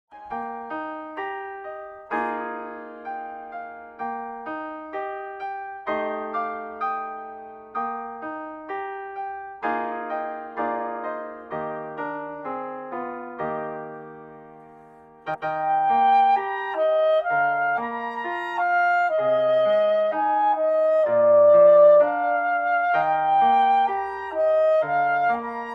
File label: Seaside Slow Section, Clarinet Solos